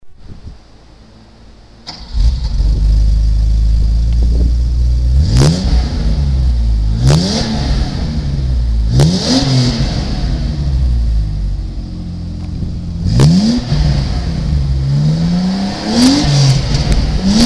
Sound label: BMW E21 323